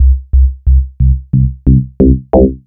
bass basic fm donks kit; Polyend; Recue

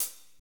HAT, Drumscapes Roland; Northstar - Drumscapes Roland

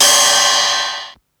Ride Filter, Ensoniq Mirage drum machine

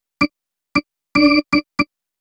Organ, cinematic funk; Sonic Foundry (Sony Creative Software); Cinematic Funk Urban Tone Poems

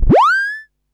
Perc Synth Raw

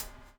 BUBBLE; Spectrasonics - Backbeat

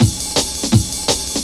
Harsh Cut, Drum Loops 166.5; Zero-G Jungle Warfare 1, 2 & 3

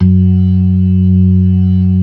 ORGAN LOWER MANUAL

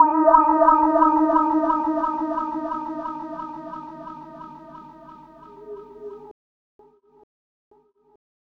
Rhodes FX; EZRollers

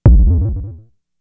FX, Drum Machines; Fricke Schlagzwerg

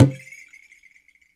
IYA ENU MU1G, World Percussion

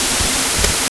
Yamaha CS6, Drums

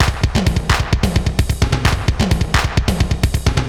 Space Echo HR-16B 130BPM

